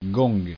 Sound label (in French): gong